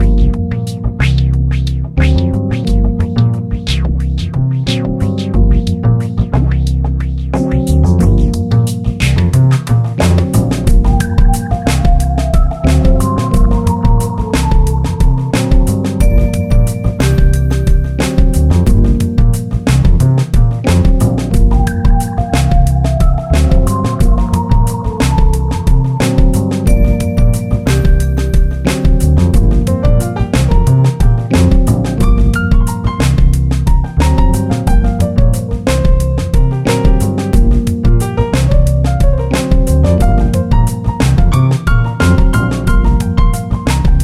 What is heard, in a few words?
Groove Retro